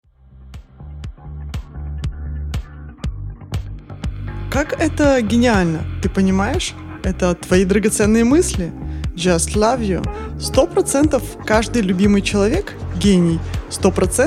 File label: LAUGH; серьезненько